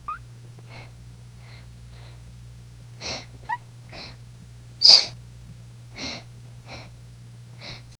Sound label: dark bedroom series of qu, indoors